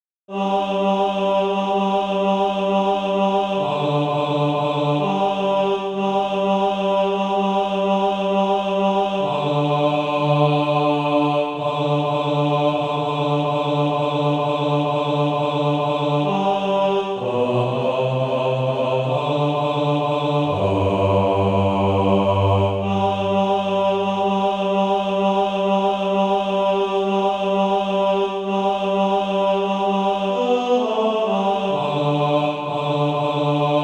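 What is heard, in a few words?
bass, Practise Music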